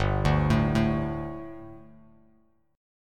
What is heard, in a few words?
G Suspended 2nd G, down, Bass Standard; Gsus2 arpeggiated; JGuitar